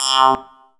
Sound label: Synth Shot; Vengeance Samples